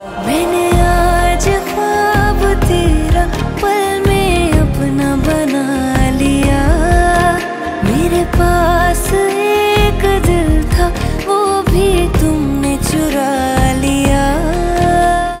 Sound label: Female Version Ringtone